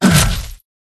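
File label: flying armour attack middle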